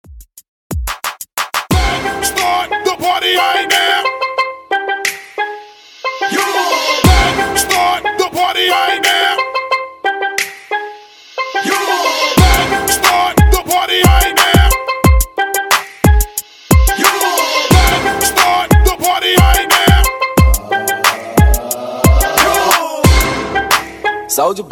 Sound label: Throwback Extended Hype 90 Bpm